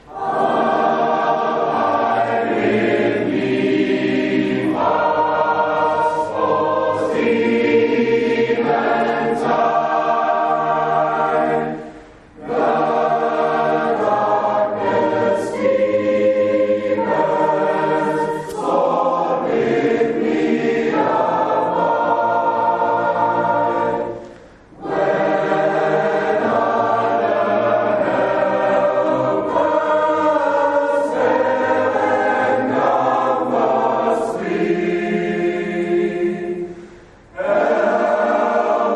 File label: Choir